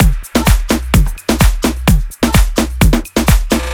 128 drum loop latin house B

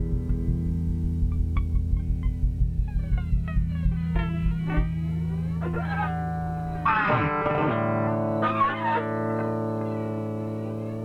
Noise Drone

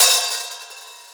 Crash; Zenhiser

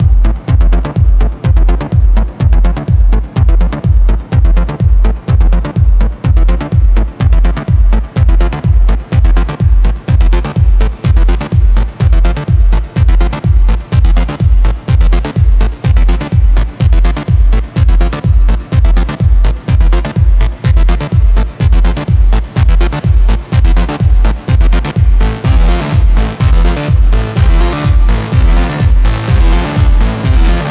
Synth Loop F 125bpm

